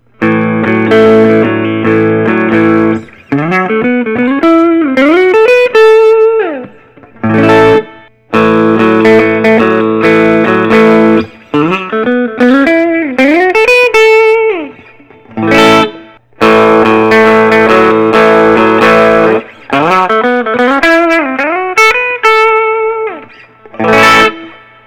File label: Gibson; minnesota burs; collectors choice